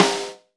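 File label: Brush Snare alt; Snares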